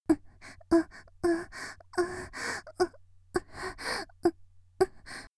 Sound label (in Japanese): 喘ぎ ノーマル; 5秒; 綾波系; 少女